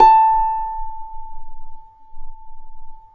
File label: A4 HARP; USB Soundscan vol.62; AKAI